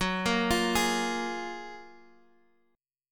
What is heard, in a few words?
F sharp Major 9th F sharp, Guitar Half Step Down